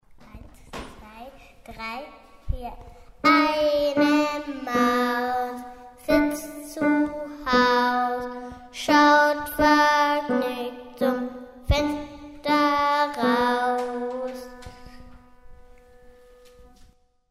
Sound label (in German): Eine Maus Sitzt Zuhaus